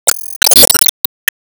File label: Sp Timeaddition